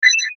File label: Mouse Over; Digital Warble Shimmer; Rollover Crinkle Ascend; Multimedia; Blastwave